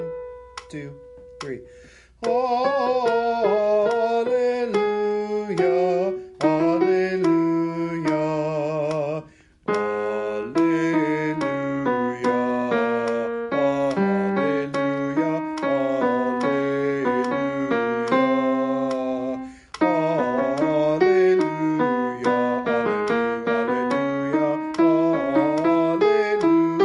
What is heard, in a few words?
alleluia alto